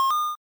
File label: SFX Money